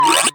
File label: Move Face Sound; Jolly Battle